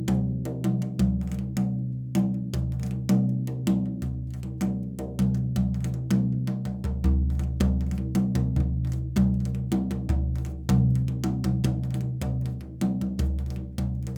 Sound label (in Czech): laditelny prstovy ramovy buben